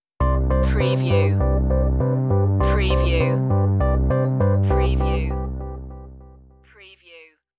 scientific discovery stinger

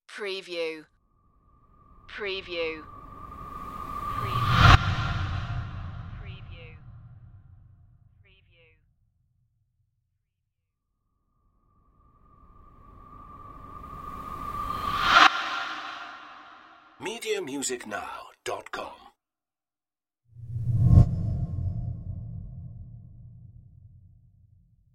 scary whooshes

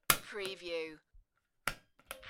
Pull Switch; beanstalk audio

